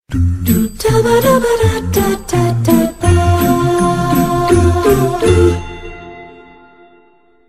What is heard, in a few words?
Text Ringtone